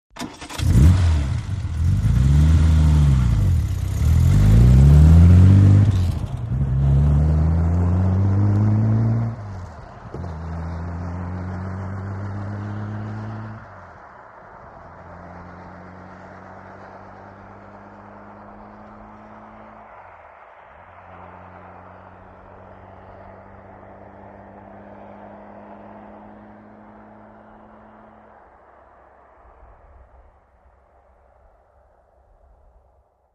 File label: VEHICLES ASTON MARTIN: EXT: Start, depart, medium, long fade.